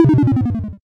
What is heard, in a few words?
game lose negative